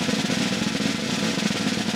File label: Vintage Snare Drum, 3.5X14